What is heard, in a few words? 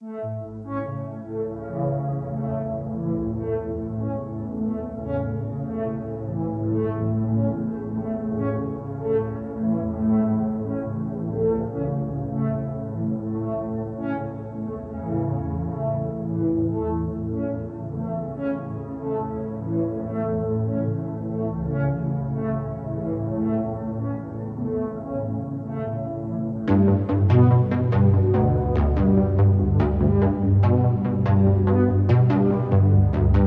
Supporter, Sequence